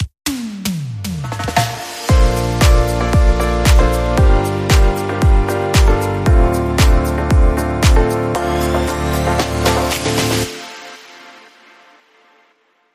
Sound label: Bumper